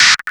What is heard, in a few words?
SCREETCHY, PHUZZ DRUMS; Spectrasonic Distorted Reality 1